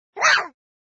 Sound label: sound attack small; monster arena